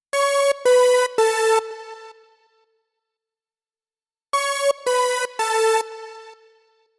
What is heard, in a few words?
Synth Lead